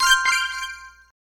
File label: flip